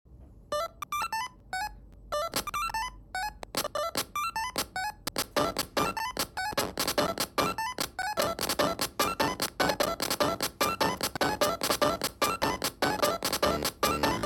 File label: chiptune; weeklybeats 2026